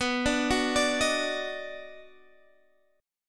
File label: D sharp Minor Major 7th Sharp 5th